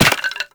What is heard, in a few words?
Run Bone, Footsteps; Warframe; Operator